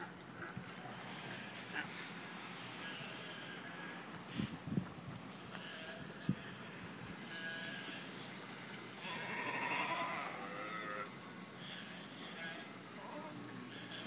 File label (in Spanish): lobos marinos de galapagos los sonidos denotan posesion de territorio